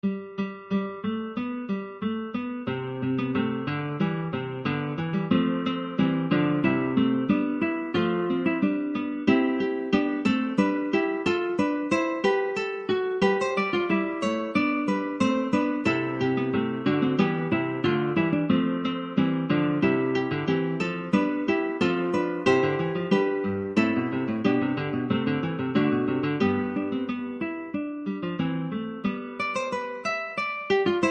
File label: Canzon Francese